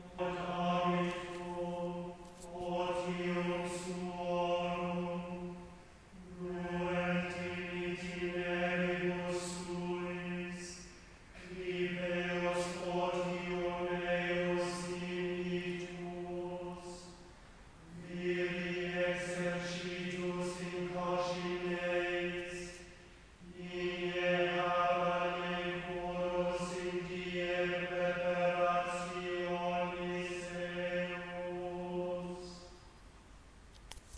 chanting

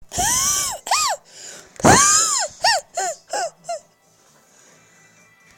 coughing

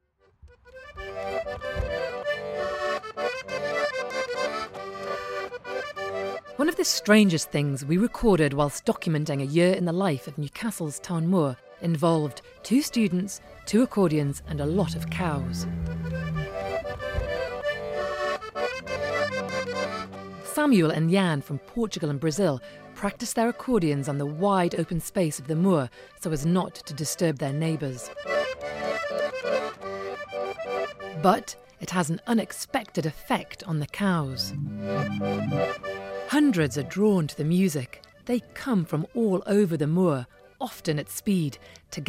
accordion

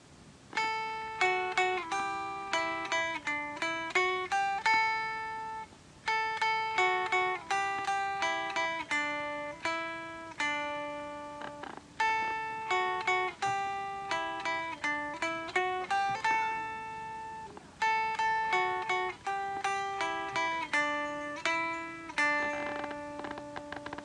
played on guitar

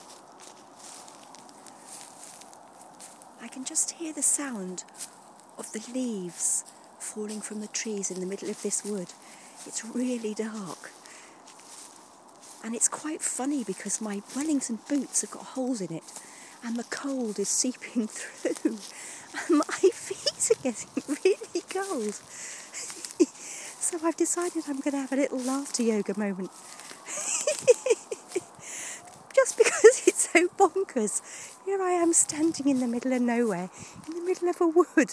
laughter yoga in the woods

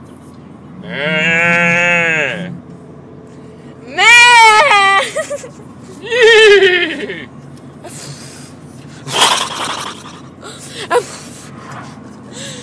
sounds of the countryside

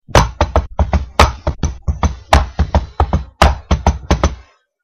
beat for searching for new powers

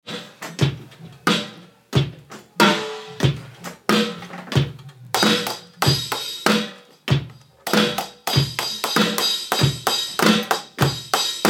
live drumming

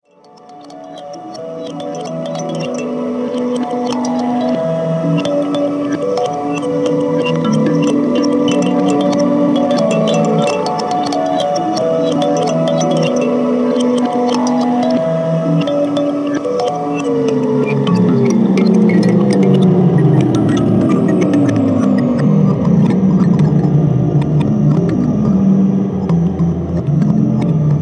samurai baby loop bass amp; kaolimba

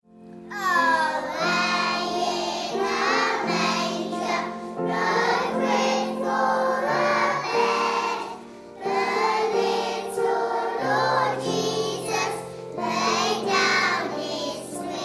away in a manger reception class singing

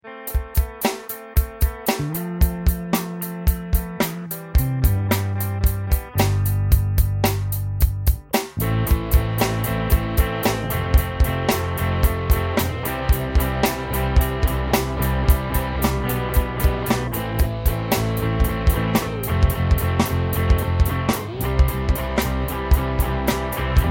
4/4 rock

